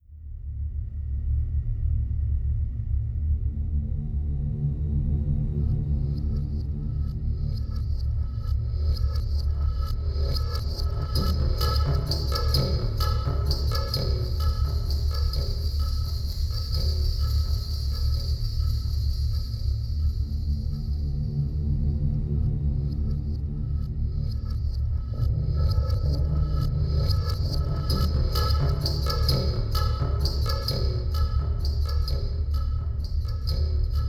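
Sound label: Fortress Entrance Ambient, game music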